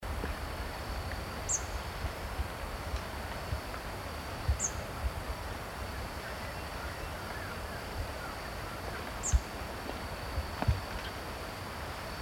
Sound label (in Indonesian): suara cabai papua